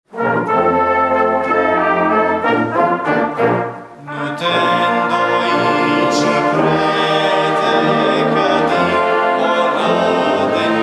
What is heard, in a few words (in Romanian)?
Fanfara; Betania Sibiu; Duminica dimineata